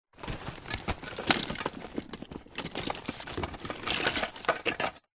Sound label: household cardboard box full of items rummage movement; zapsplat